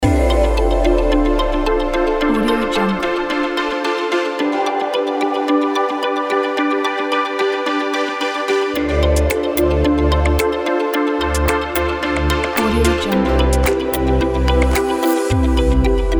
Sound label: digital abstract loop